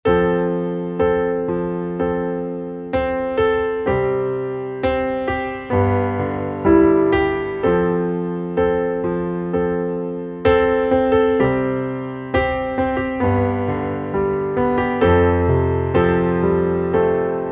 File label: Underscore PIANO; Worship Flow